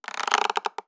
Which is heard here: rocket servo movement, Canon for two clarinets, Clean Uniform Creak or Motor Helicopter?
Clean Uniform Creak